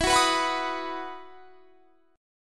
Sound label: E Major 7th Suspended 2nd; EM7sus2 strummed